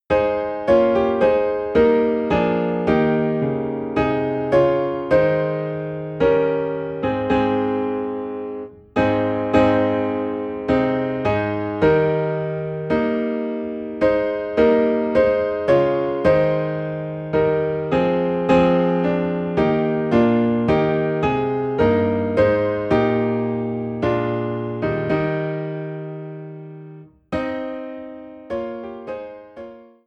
Piano in A Flat; christmas; O Come All Ye Faithful

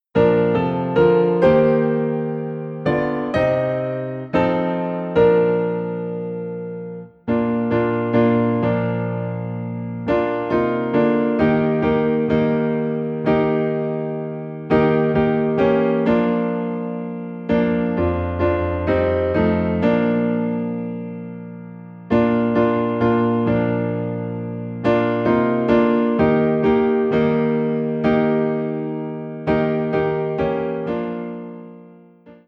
Piano in B Flat

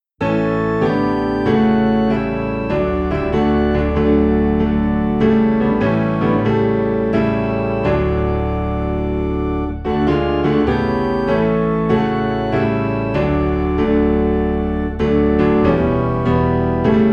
Piano and Organ Low in D; In My Heart There Rings a Melody

